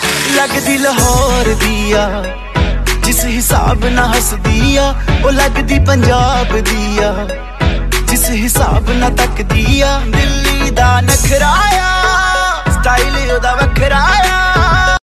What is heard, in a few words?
love tones, bollywood ringtones